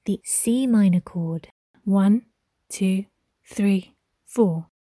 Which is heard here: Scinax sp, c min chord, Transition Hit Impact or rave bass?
c min chord